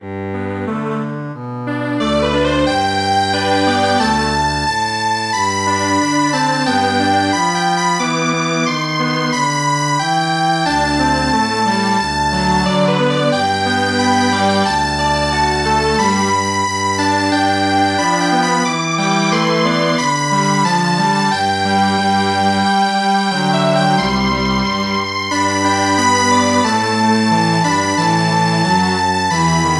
Strings Quartet, traditional; The Great Charlemagne

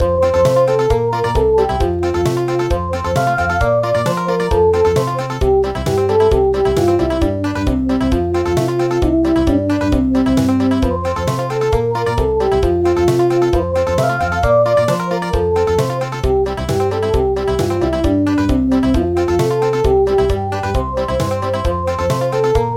Country

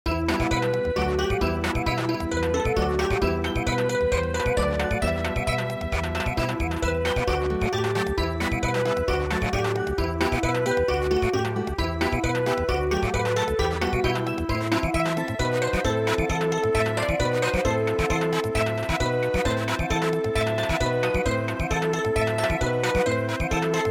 Samba

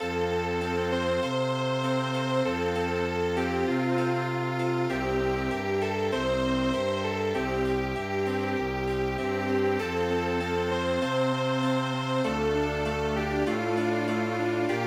Chamber Music

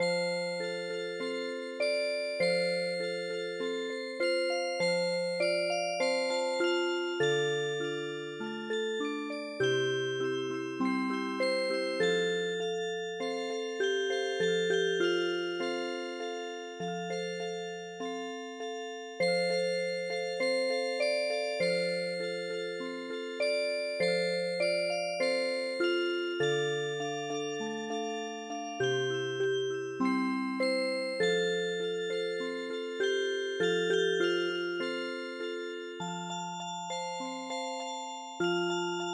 Music Box, traditional

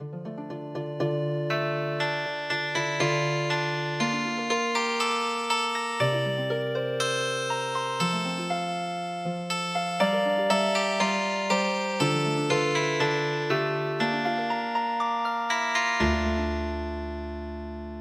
Healing Harp, traditional

